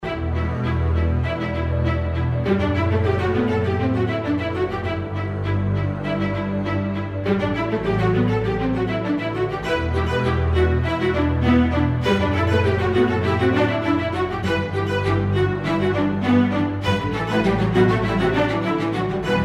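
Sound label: background music, Tension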